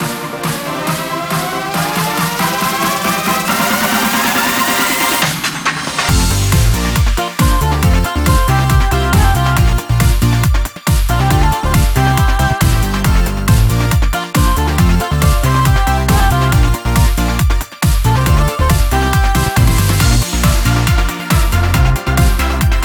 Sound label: Menu Gacha; Bgm Menu Gacha Star 3; Bgm Menu Gacha Star 4; Bgm Menu Gacha Star 5; DanKira!!! Boys, be Dancing!; Android, iOS, Mobile